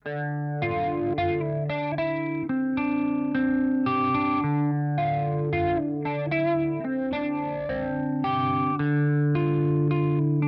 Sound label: HX Stomp Modulation Flanger; Vergleichstest